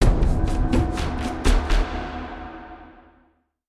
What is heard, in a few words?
Caves Drums Ending; Ninjago Monastery; lego universe macos windows gamerip 2010